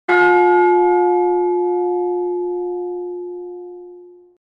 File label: Temple Bell Ringtone For Message; ringtones